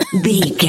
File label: voice female cough